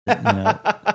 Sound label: short laugh